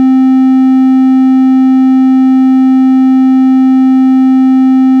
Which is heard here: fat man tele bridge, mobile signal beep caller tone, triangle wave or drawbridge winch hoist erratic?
triangle wave